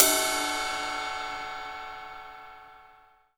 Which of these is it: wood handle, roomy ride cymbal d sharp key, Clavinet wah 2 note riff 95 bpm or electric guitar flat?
roomy ride cymbal d sharp key